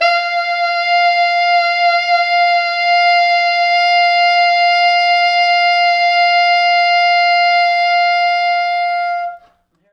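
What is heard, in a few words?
tenor sax susvf ext. range, F4; East West; Quantum Leap Horns Sax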